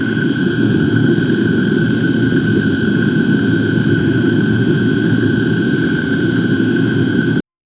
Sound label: lava freeze sound effect